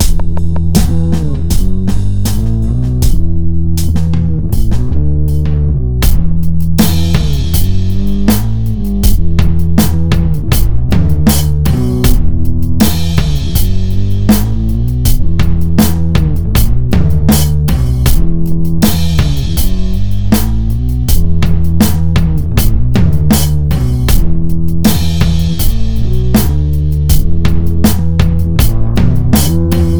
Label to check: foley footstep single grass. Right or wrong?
Wrong